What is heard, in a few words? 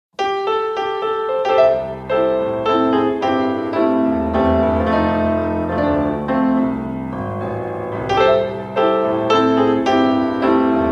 BLUES